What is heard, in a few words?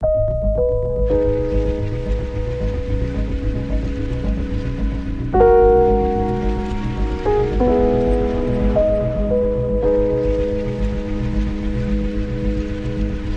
Beatless